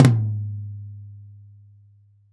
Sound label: RAW TOM, Drums Acoustic; USB Soundscan vol.10; AKAI; ALL TOMS